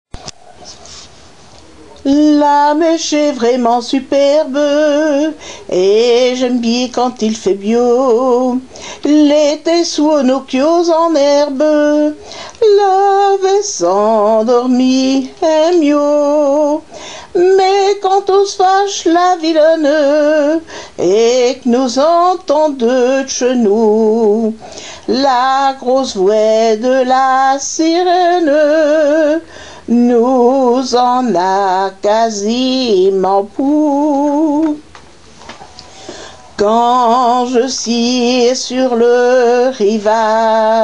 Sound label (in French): chanson en patois